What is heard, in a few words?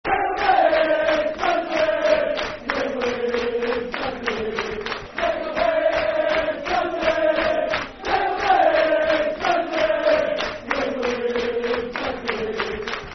Football Chant; Craig Levein's Tangerine; Dundee United Dufc